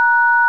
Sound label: dtmf g